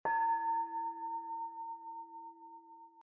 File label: tone; organic